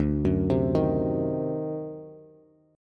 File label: D 7th Flat 9th D down Bass; D7b9 arpeggiated; JGuitar